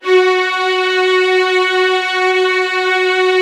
LDFV F#4, LOUD VIOLINS; Q Up Arts; Denny Jaeger Master Violin Library

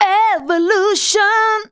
vox, Techno Trance; Ueberschall

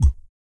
DEEP BREATH, Vocal Planet Blues & Soul; ILIO

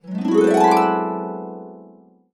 E, Harps; USB Soundscan vol.62; Complete Harps; AKAI

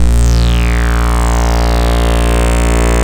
ARP Synths; Analog Odyssey; E-MU Formula 4000 Series Vol. 3 – Analog Odyssey

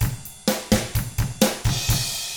127 SURF ROCK

